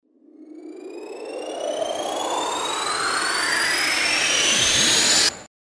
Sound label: RISER